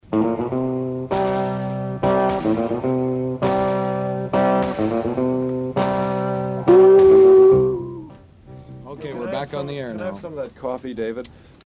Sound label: RandB